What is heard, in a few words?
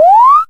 jump, Retro Sounds; Kenney's Sound Pack; Retro Sounds 1